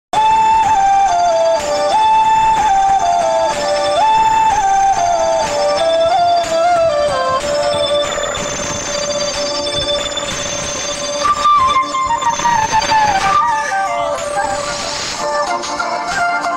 flute bgm

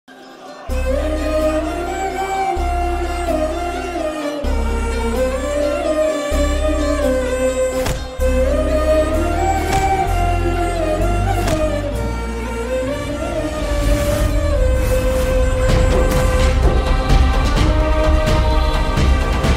sad bgm ringtone; rangasthalam

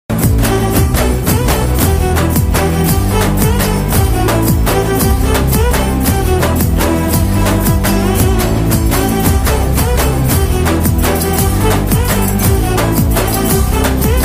bgm song

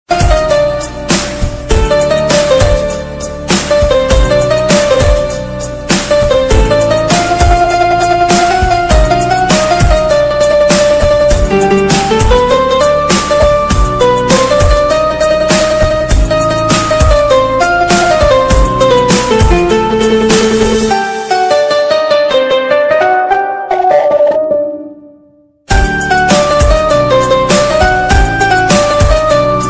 bgm, ringtone; chhatrapati shivaji maharaj

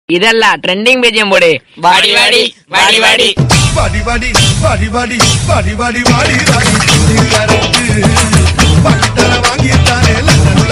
tamil bgm ringtone; free fire

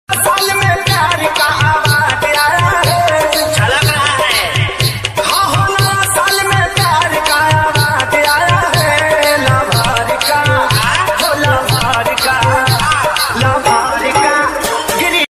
happy new year song; happy new year 2025

